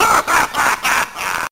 laugh evil

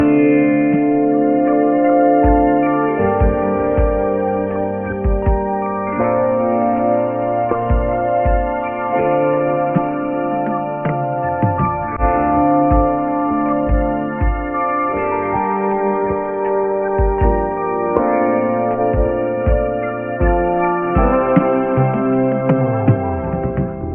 keys

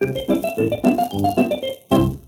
mischevious

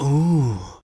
ooh, Voice

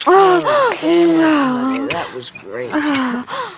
FEMALE ORGASM that was great; Voice

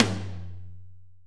Toms, Real

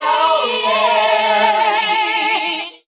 "oh yeah" SUNG

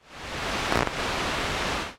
static, Rumbles

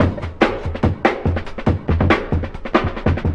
stutter man, drum loops, funky

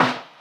xyla, Snares Soft

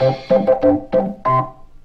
kiddie organ

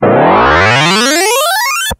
ready to fire, Zaps and Beeps

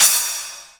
Real Open, Hats

